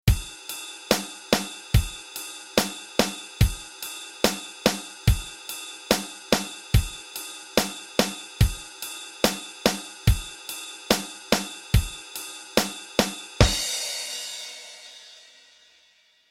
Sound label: rock basic, rock basic rhythmen